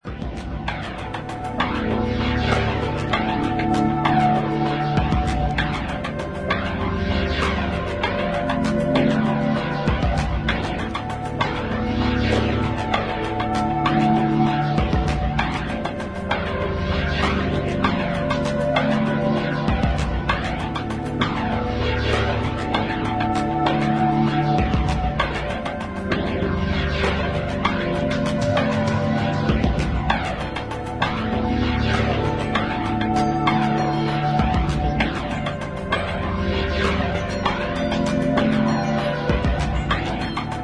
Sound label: AMBIENT EXPERIMENTAL